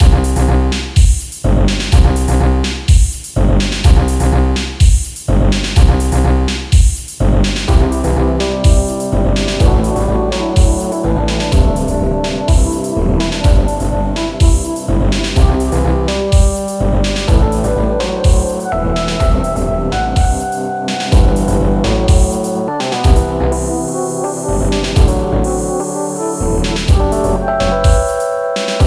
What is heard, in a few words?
Electric trio